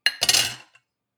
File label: cutlery on plate place; soundpool